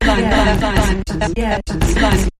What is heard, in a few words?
Sampled Voice Percussion Variation